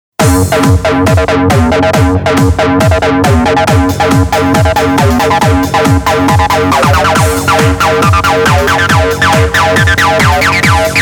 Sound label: Bassline; Sony Ericsson; PlusFourSix